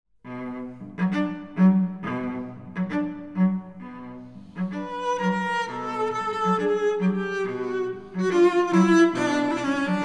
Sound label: Cello Duo carmen